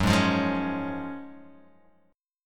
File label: Gb Major 7th Suspended 2nd, Guitar Open G